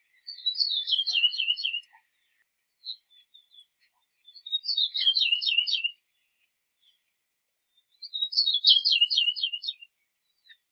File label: Cabin Male Song